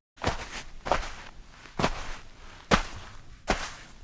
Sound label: Footsteps walking on sand with shoes